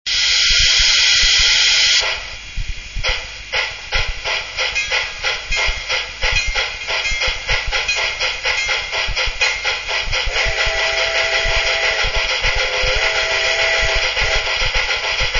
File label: mikado, loksound